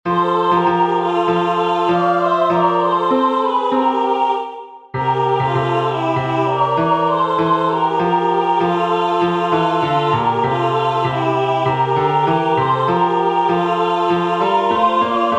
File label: Basse; Luci care